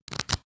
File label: card shuffle; ui; starruler